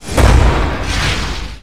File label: Slow motion; characters